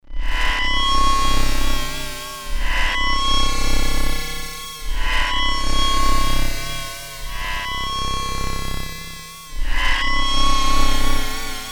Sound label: evil fm, yamaha sy99; synth